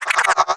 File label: Notification End; FPS; UI; FirstMicroGame